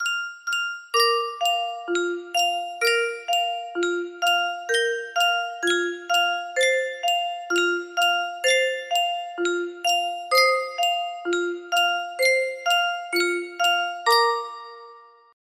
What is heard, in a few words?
custom tune music box, music box melody